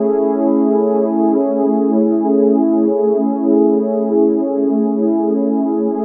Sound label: Cream Pad (maj7th)